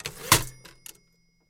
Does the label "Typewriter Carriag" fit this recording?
Yes